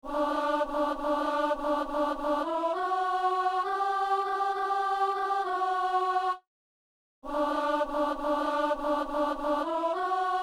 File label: alto; Messe de Saint-Boniface - Sanctus